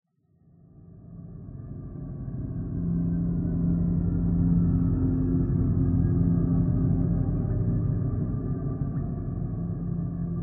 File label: Library Main Pad